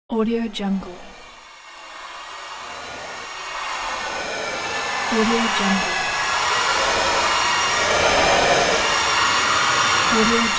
transition fearful time

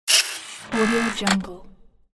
glitch

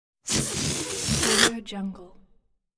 letting air out of balloon; applehillstudios

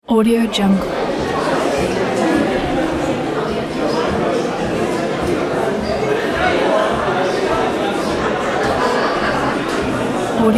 big crowd talking ambiance; landhausstudios

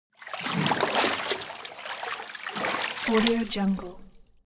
movement water strong; foley; smartsoundfx

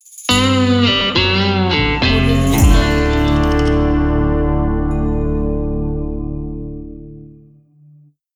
western opener logo

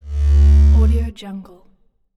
tech transition

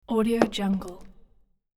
dropping shoes onto floor; hollywoodedge

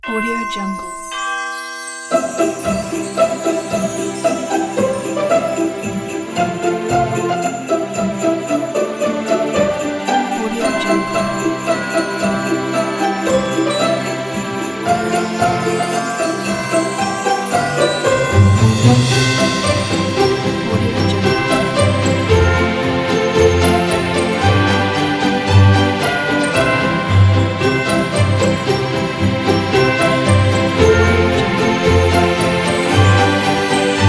jingle bells orchestral

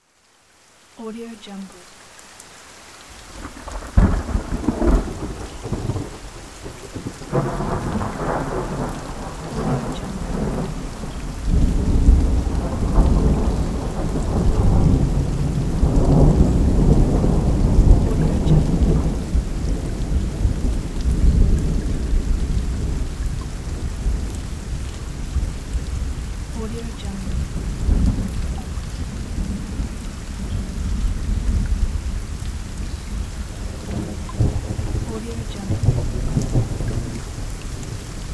rain and thunder

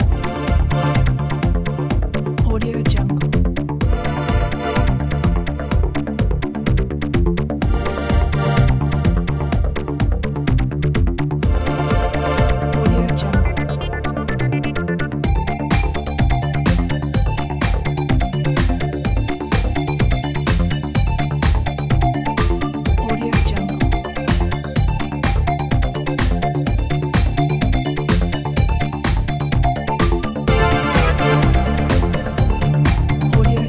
fancy arcade afternoon; basspartoutx

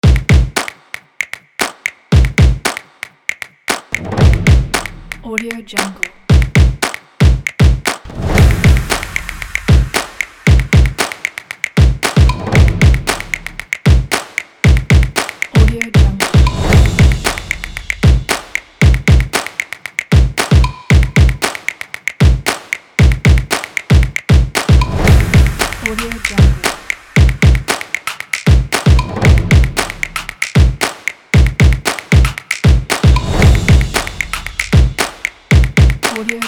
drums

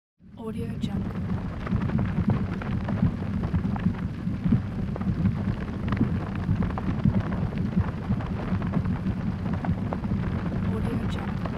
thick bubbling mud

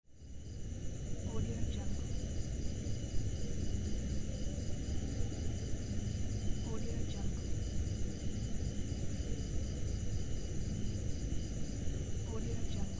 bugs ambience insects night

